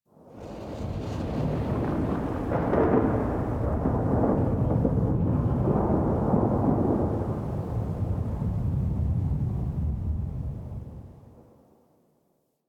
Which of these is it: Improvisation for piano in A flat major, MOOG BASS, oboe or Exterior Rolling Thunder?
Exterior Rolling Thunder